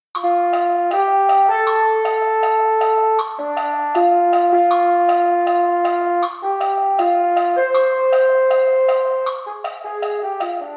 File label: Mellophone; concert corps